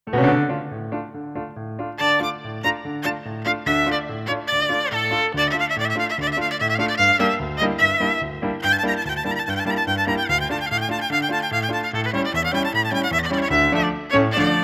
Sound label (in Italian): violino piano; CIARDA